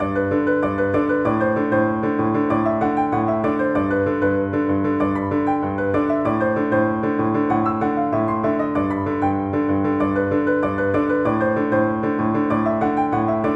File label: cajun carnival piano